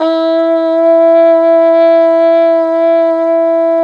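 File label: brass plunger trumpet, plunger mute; Plunger & Wa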